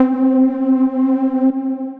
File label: grey stab pad; Synth Pad; Ableton; Factory Packs; Build and Drop